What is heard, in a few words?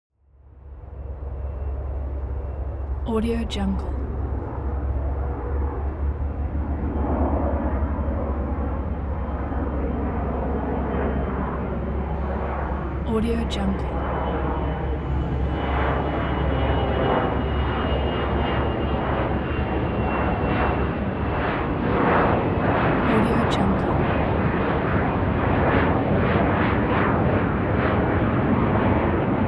transportation jet plane flyby land